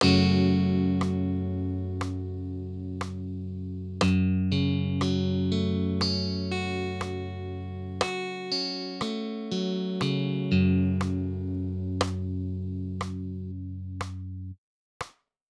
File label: F#m rhythm